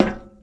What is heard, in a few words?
metal, footsteps; Garry's Mod; tf2